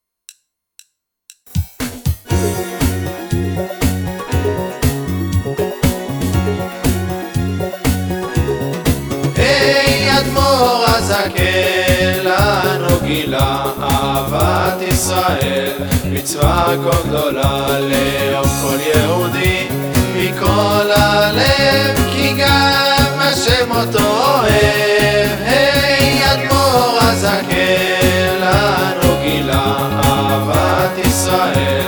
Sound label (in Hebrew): גינגל אהבת ישראל בנים